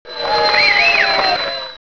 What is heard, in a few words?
hurray